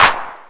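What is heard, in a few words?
snare drum sound a key, tuned to the A note; Duration 0:01; Mono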